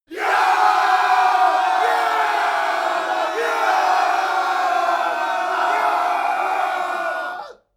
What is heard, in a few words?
battle cry